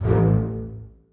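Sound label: basses staccato a1; Sonatina Symphonic Orchestra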